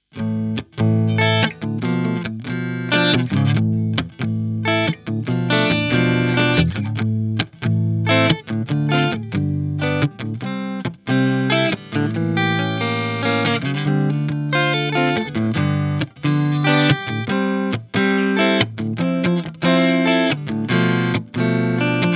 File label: clean; ampvergleich